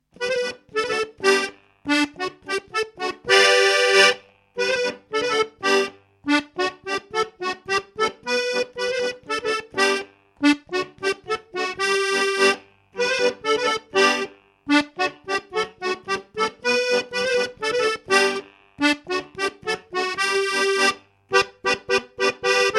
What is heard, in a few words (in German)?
Stoppgalopp